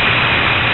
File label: steam